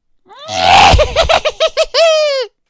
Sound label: hihih